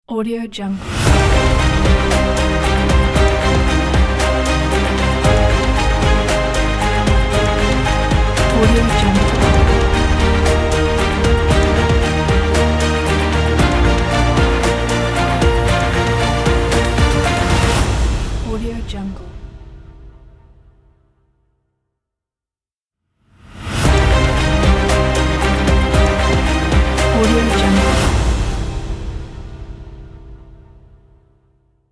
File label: epic abstract intro logo; audiochameleon